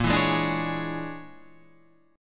A sharp 7th Sharp 9th Flat 5th A sharp down Guitar Half Step Down; A#7#9b5